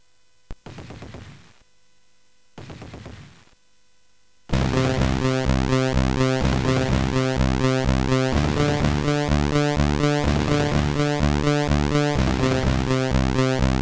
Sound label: Music, CSG8580R5